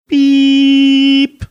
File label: beeeeeep